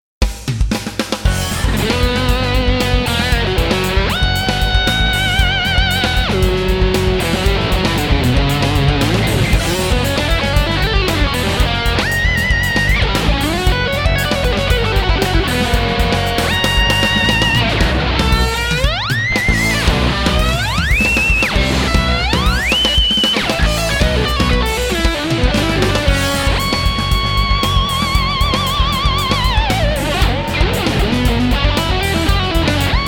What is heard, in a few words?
pedal bend